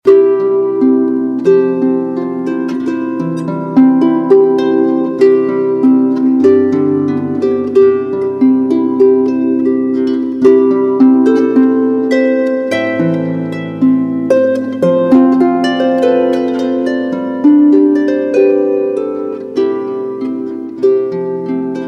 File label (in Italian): Marcia Nuziale, ARPA CELTICA; R Wagner